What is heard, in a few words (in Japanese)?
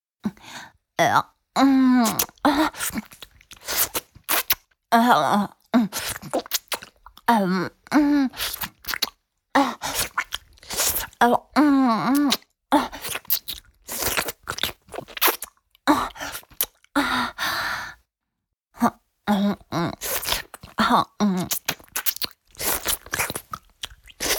フェラチオ(弱):亀頭を舌で舐めて潤いを与える; 実用ボイス抜粋集